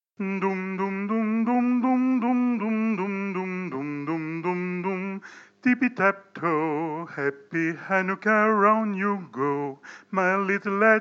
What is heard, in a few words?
Hanuka Dance soprano chanté